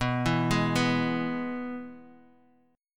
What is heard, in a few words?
Ab Minor Sharp 5th B